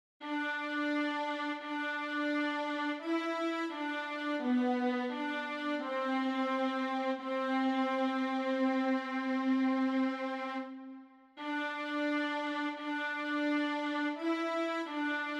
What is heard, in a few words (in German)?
Slow Fox Bratsche